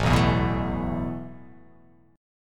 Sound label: A Suspended 2nd, guitar chord; Asus2 strummed